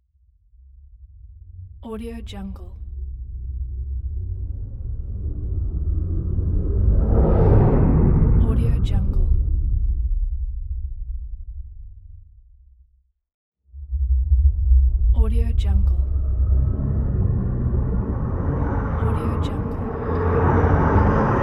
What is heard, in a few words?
deep rumbling whooshes